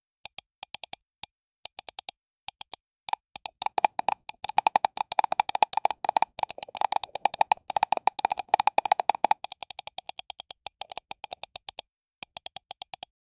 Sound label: sound of mouse click in